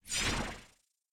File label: Teleport Vanish